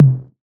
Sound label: low tom drum single hit c key, Royality free tom sound tuned to the C note.